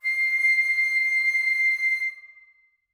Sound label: flute leg C7, Woodwinds; BBC